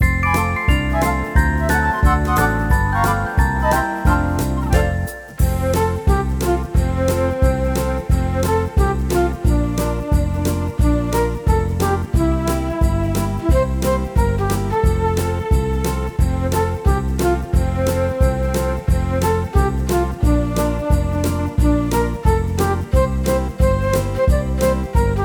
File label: jingle bells